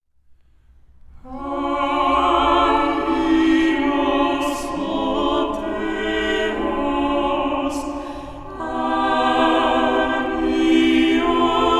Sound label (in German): Chor; Hagios